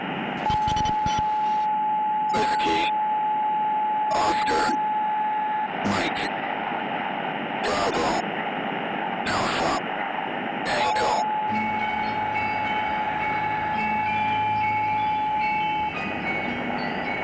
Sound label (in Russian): shpionskij radio signal